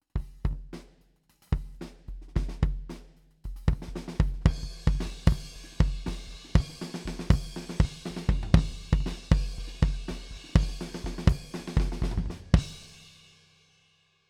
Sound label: Kick, Head Off 35cm U47 FET Outside 30cm